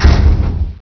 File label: door stop; gearbox